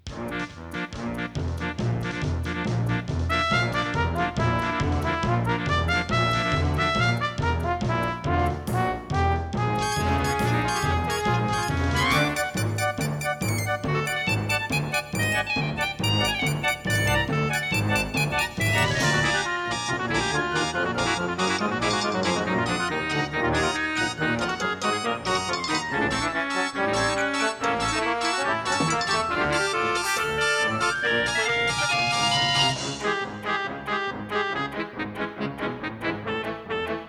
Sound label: AWE64 Gold; Day of the Tentacle Opening